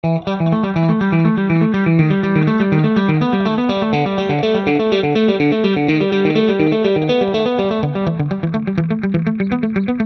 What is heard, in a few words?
TC Electronic G Major Phase Delay